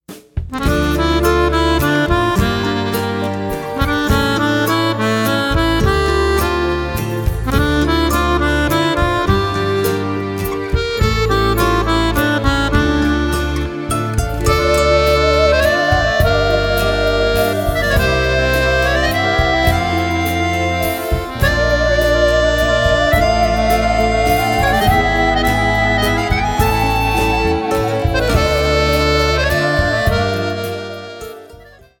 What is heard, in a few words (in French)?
vibrations musicales